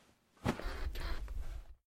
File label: push on bed